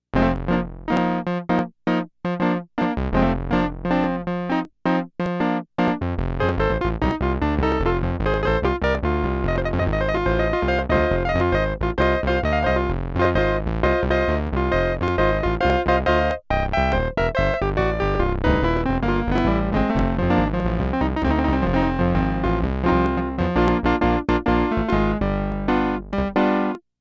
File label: Reed Piano